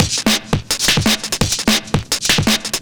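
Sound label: Drum and Bass Beat 170, drumnbass 170bpm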